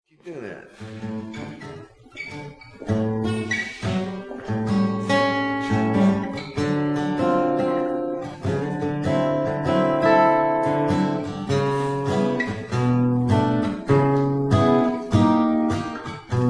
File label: Blues Interlude